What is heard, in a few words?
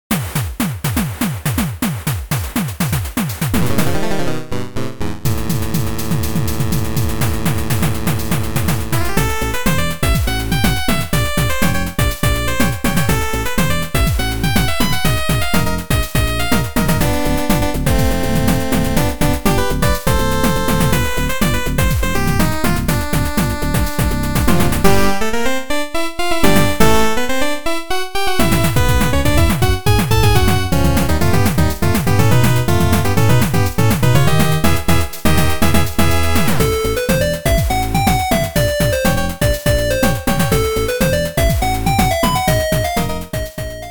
battle; marioRPG